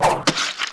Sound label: wooden attack